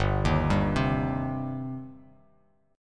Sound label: G Suspended 4th Sharp 5th G down; arpeggiated